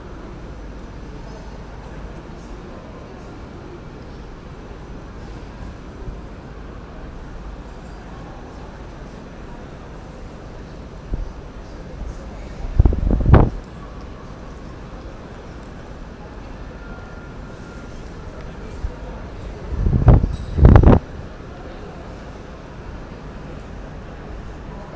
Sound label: wind noise test